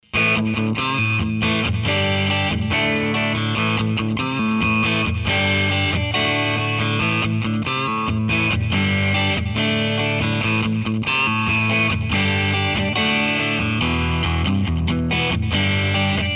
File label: bridge neck